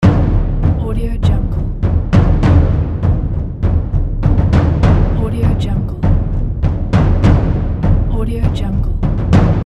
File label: war drums loop